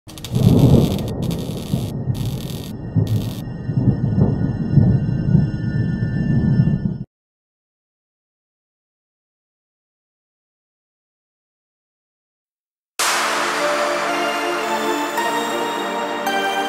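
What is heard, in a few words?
style 80s new italo disco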